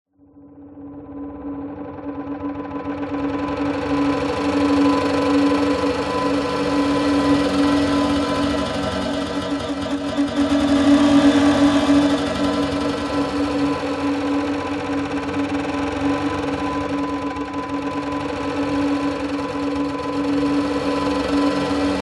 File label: glitched tones abstract texture